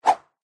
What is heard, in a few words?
strike, Ball Bat Glove, baseball